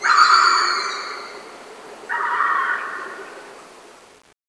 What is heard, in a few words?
jungle call bird